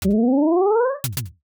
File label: servo move; horror sf